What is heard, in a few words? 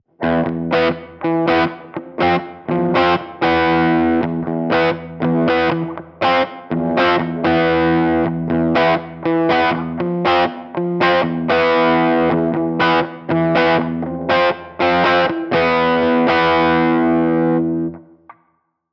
Boss SD1